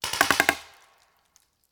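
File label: Paintball Gun Impact; Sound Ideas; Guns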